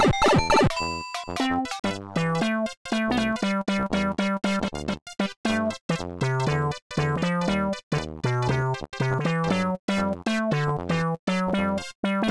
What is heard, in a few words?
Bad Music